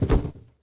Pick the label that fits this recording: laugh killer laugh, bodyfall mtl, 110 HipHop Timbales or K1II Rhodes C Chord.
bodyfall mtl